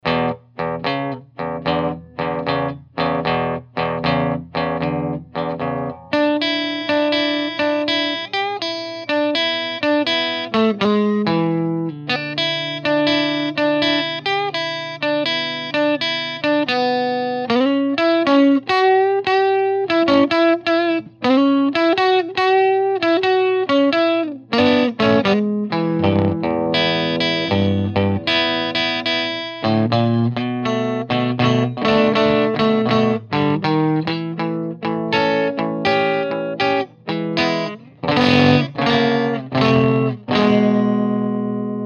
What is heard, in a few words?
blues; jensentone